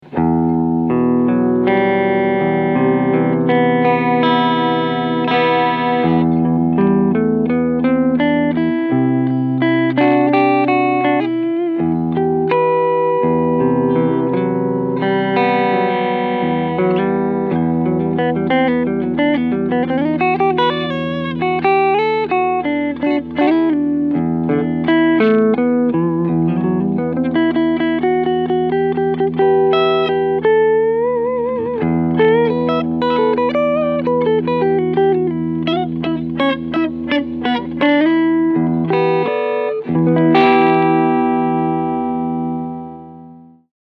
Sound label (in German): Cleansounds beide PUs